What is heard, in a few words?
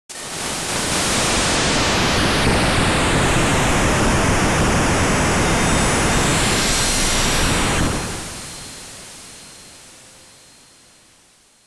The Sound Of Nuclear Missiles Flying 核ミサイルの飛んでいる音, pc-98; Elle